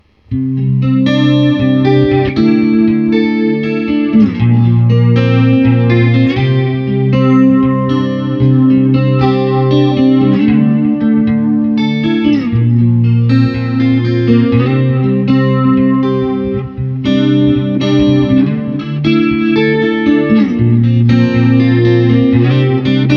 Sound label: FW65 112 Clean FX